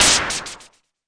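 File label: mbrute noise, Arturia MiniBrute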